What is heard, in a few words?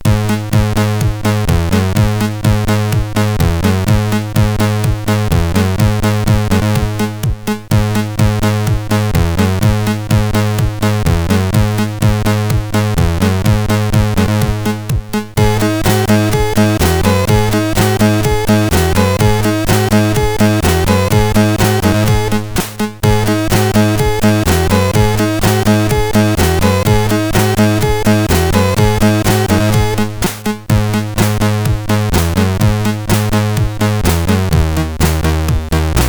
Rap, sid CSG8580R5; Signor